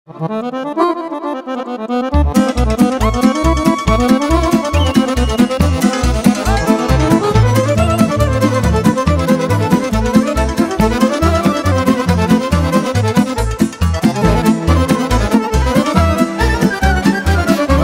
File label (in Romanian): muzică moldovenească instrumental; Colaj de muzică moldovenească